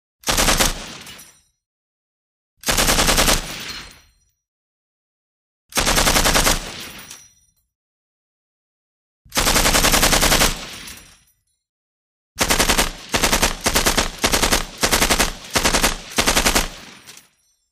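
Large-sounding Multiple Shot Bursts With Shells Falling To Ground After Each Burst. Last One Is Very Long. Close Perspective With Short Echo. Gunshots.; M-60 Automatic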